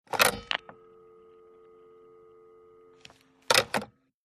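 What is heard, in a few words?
Telephone Pick Up Hang Up Faint Dial; Futz Movement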